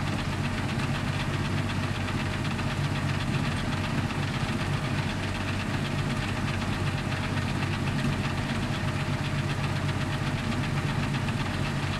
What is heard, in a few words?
panther engine rpm; War Thunder